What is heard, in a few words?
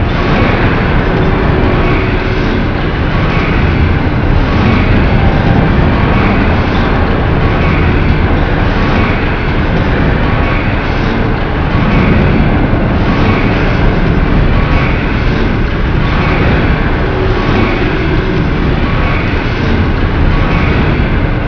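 escalator; excalator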